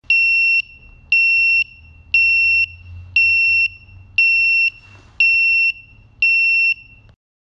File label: Beeps Blinks Lost Connection to Radio